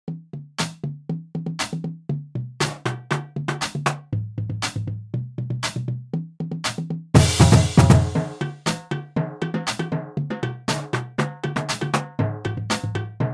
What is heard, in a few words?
clubdrum